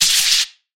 sparking lightning; soundscrate

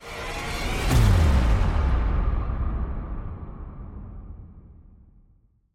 Bass Drop; SoundsCrate

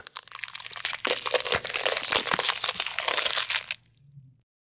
body gore

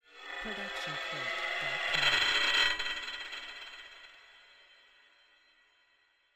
horror metal chaos; soundscrate

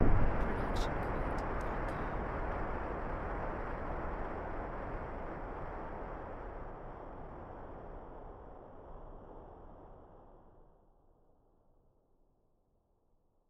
Deep and Far Explosion; SoundsCrate

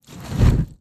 Fire Lighting Burst; SoundsCrate

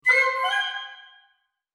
woodwind double grace note

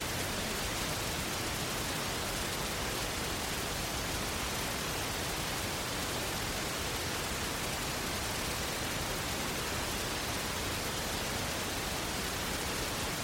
waterfall; soundscrate

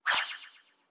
Shuriken Throw With Tail

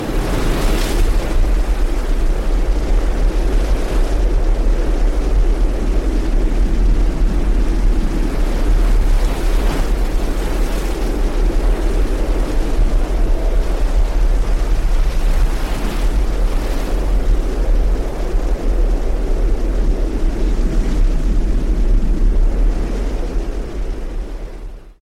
tornado; soundscrate

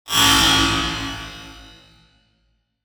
robotic sword swipe

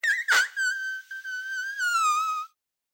Fart Whistle; SoundsCrate

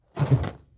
balloon rub short

